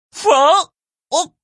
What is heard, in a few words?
clock